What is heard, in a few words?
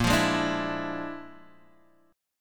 Bb-7th Sharp 11th, Bb7#11 chord; Bb7#11 strummed